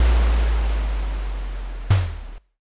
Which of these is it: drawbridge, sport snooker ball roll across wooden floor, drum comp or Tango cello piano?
drawbridge